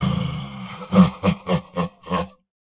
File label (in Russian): Смех; Турбосвин